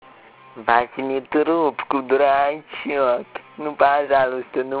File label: ring